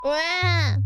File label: wah